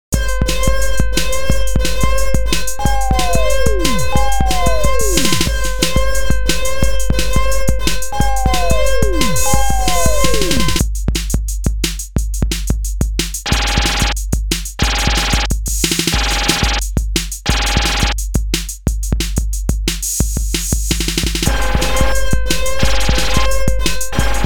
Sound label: Sine Wave Song